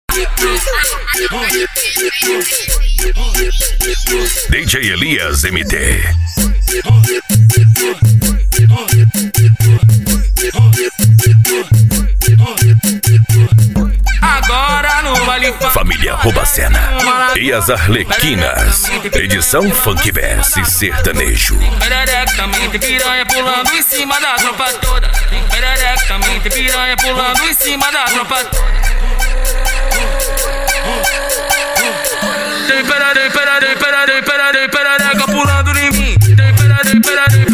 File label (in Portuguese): funk bass e sertanejo